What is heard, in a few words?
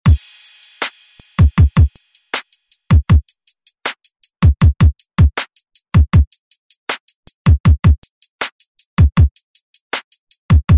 Hip Hop 90s; DJ Mhark; ReDrum